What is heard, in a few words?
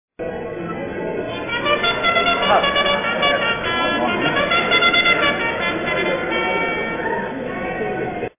horse Race